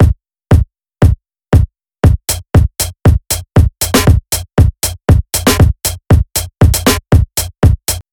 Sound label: One Shots; LANDR